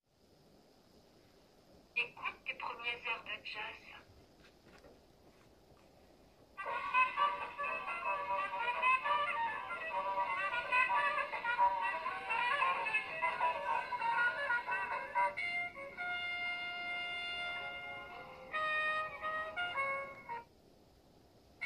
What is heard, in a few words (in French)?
airs de Jazz